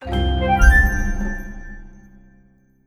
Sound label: UI InGame Unlock; gamerip; Hero Defense Haunted Island